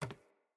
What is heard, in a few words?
Ladder Climb Wood